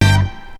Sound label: shot; BEAT2000